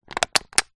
drink can squash in hand; foley

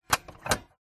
cash register till drawer note clamp lever lift; zapsplat

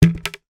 toy plastic bucket drop on carpet floor; sound effects one

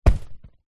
mattress grab hand; household

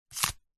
money credit card remove from wallet; foley; zapsplat

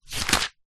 notepad page turn thin paper a4; zapsplat

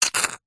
foley liquid gel suck in single short

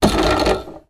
toy table football bar spin x1 hard; zapsplat

